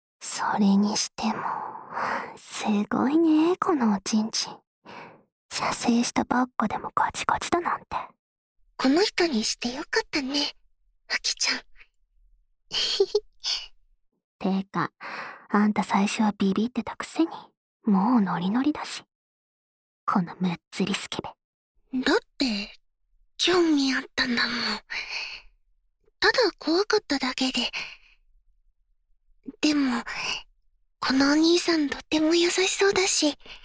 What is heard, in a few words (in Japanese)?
耳舐め, ASMR; フェラチオ; 射精ニ度目